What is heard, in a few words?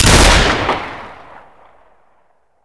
double fire, double barrel; svencoop; hunger; weapons; dbarrel